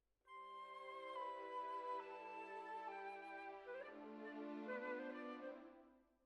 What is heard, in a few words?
Clarinet